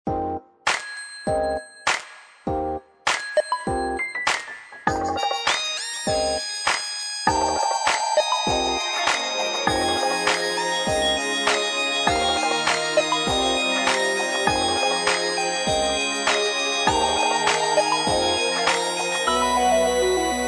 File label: Morning Bird, Ring tones; LG KP500 Cookie